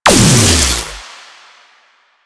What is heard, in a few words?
Antimatter Drop Fully Charged; Warframe; Warframes